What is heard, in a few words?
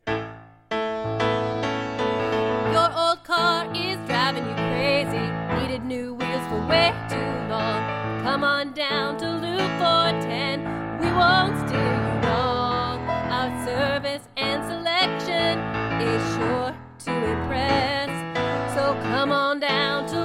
jingle; ipac; weeklybeats 2012